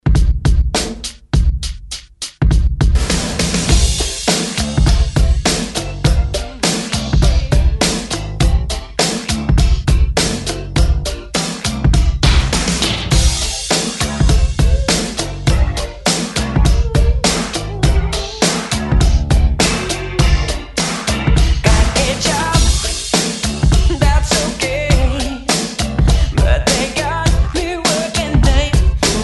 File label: 102 Bpm; DJDOLLS; ReDrum